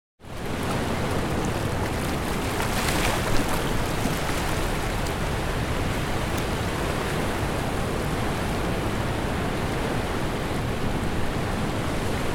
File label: wave